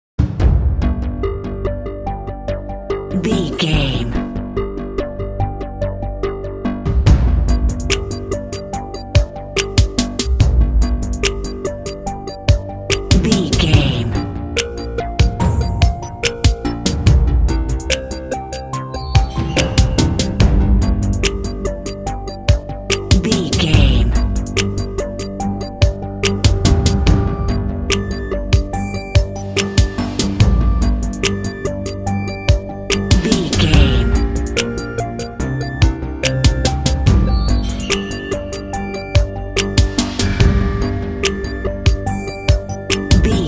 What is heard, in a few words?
dramatic decision countdown no strings